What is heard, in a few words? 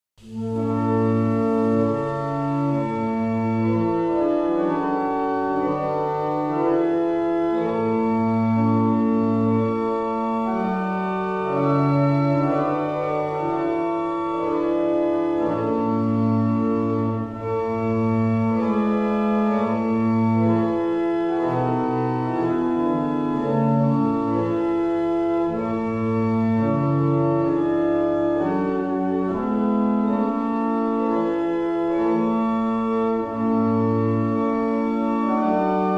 Luthers Hymn, barrel organs; Avington